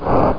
Stone Slide; HotLeather2

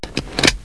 unbuckle

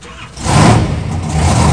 ENG START

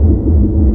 ufo; REDNECK; RAMPAGER

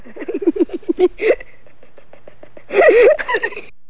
child; SoftKey Entertainment Pack; SoftKey Multimedia

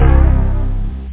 super bass

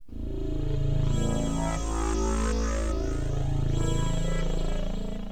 FUZ PAD, Keyboards; HipHop; LiveAct Pool 1

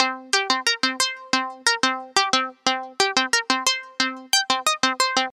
90 Melody 2

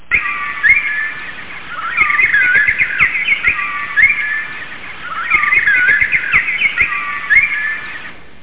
Birds

